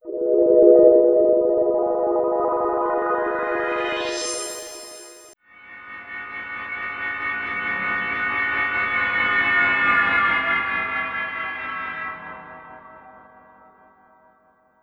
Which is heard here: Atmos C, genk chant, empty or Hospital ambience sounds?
Atmos C